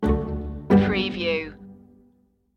lightly macabre stinger

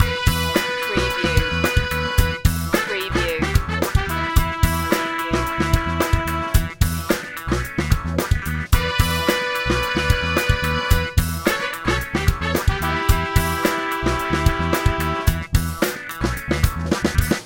cadillac loop

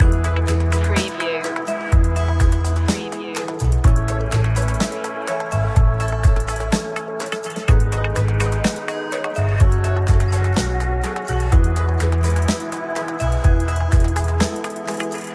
positive flow loop

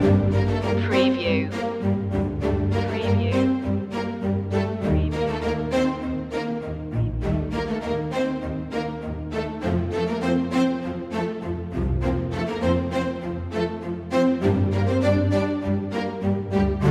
the storm is coming loop

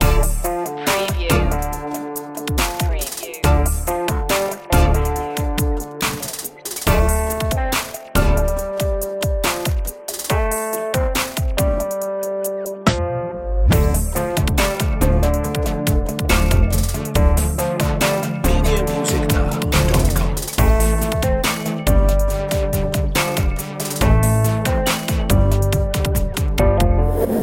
so hip loop

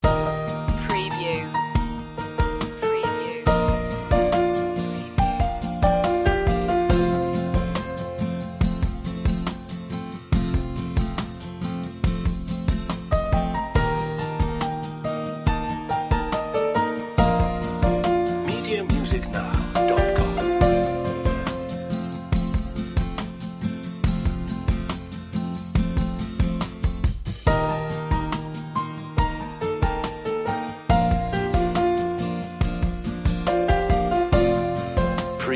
acoustic

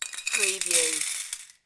Slot Machine Win - Coins Falling Into Payout Slot

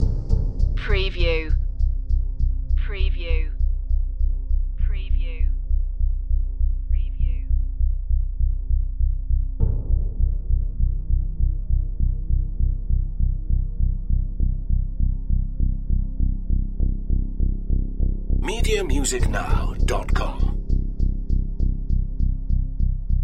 tension pulse loop

